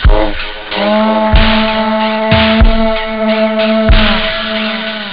acids; Amiga Soundtracker Sample Packs

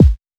kick fuzzy

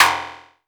Tempest Analog Snare, Drum Machines

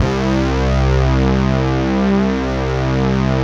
SD1 FAT, Synthesizers, synbrass

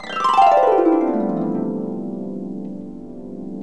HARP GLISS

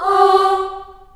G3 child AH, choir; CHILD AHS

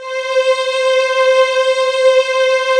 SLOW STRINGS, JV PADS; Pro-Rec - Synthsonic

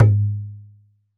Tabla Ge; Roland BK-5; Percussion

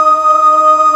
TRON FEMALE; E-MU Formula 4000 Series Vol. 3; Analog Odyssey; VK Instruments

